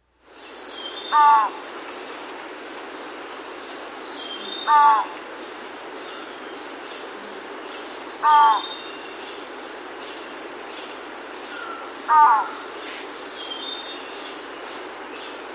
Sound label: Broad-Billed Trogon, Nature's Songs, S. America